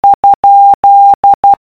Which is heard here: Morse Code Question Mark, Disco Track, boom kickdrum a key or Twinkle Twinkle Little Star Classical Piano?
Morse Code Question Mark